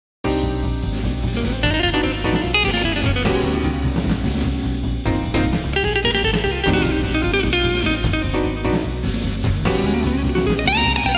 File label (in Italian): musica urban